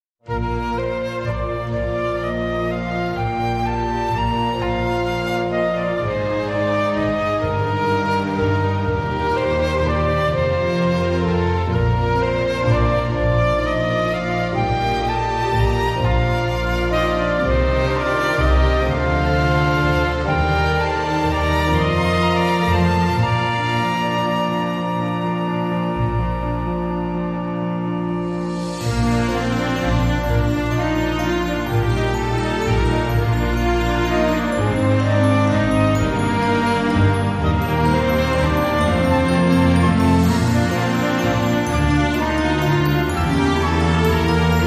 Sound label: No Jingle Bells